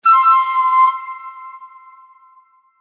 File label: correct; 和風のピンポン×2回。その４。; 正解の音・ピンポーン